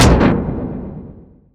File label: gyro carom; weapons; dota; pangolier